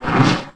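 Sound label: droid attack; Star Wars Knights Of The Old Republic I; XBOX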